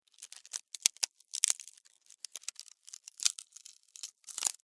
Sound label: Insect Termite Bite Wood Long Splinter